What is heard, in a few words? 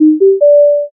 success